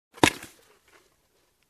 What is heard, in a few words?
Sewing Tool Box cover close